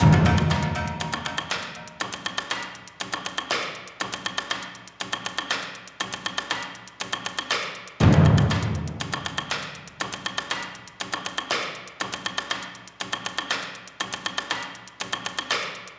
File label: Enemies Percussion Extract LOOP